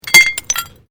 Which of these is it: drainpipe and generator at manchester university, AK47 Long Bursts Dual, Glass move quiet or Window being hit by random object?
Glass move quiet